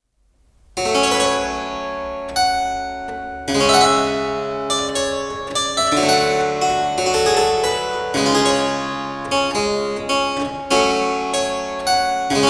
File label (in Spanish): clave